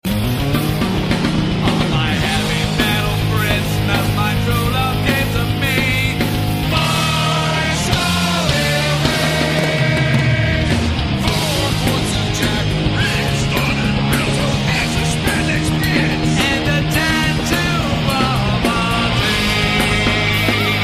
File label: heavy metal christmas